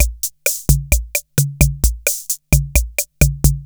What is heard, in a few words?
KR33 AFRO; Crypton - DrumBase5000